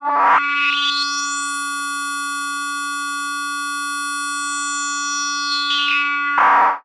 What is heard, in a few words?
Odd Modular; radiosonics